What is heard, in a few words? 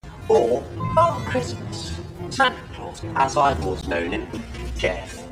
uncombobulated audio